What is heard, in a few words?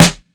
rich top end snare f key